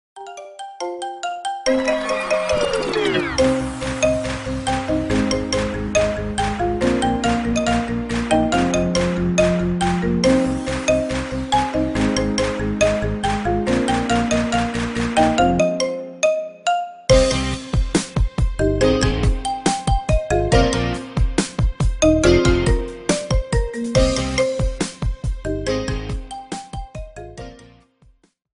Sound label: marimba ringtone remix